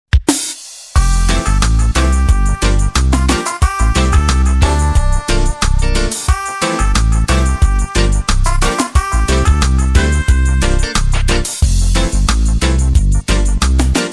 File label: Richer Reggae Instrumental